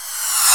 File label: REV CYMBAL; E-MU Formula 4000 Series Vol. 2; Dance Set 2